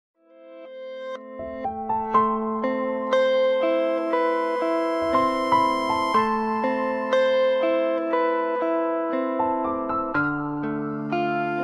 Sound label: Ringtone